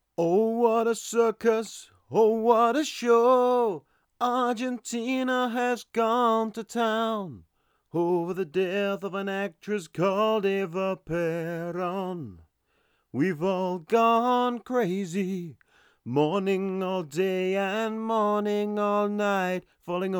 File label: Singing